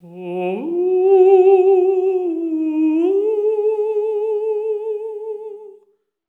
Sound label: FALSETTO; Spectrasonics; Symphony of Voices